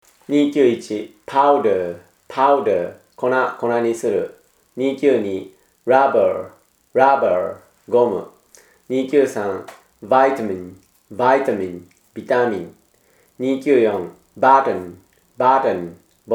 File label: tango